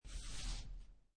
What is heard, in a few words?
Poker Chips Stack Of Chips Slide On Felt; Gambling; Lynne Music